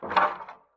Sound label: basket, basketball